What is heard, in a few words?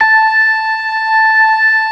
ORGAN, LOWER MANUAL